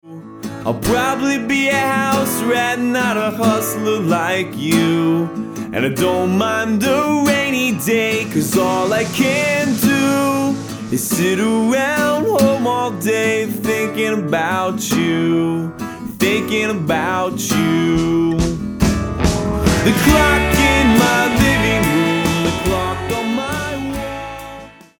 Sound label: Clock Song